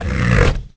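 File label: scream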